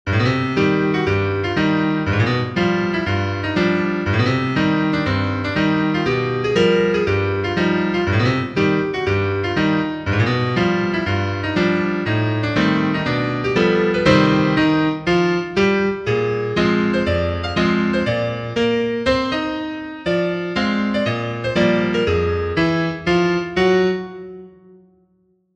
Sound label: piano